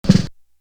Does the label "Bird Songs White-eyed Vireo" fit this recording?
No